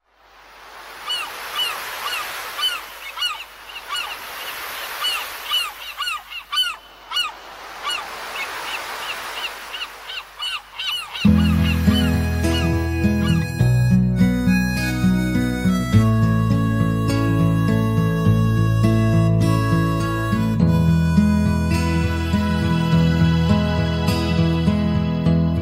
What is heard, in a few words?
romantic croatian